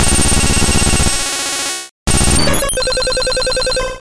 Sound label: Rock Slide; sfx; attack moves; pokemon; gen 1; rby